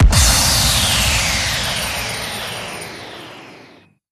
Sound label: big Win Start; joker pro